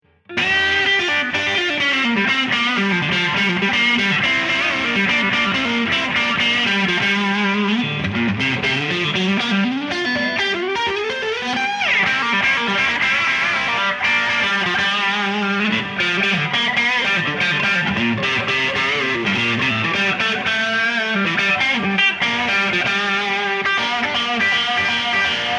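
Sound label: Distortion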